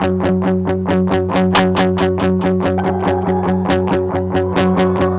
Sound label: Guitar Chugged; Sonic Foundry (Sony Creative Software); Underground UK House & Electro